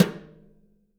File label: BRUSHES, Living Drums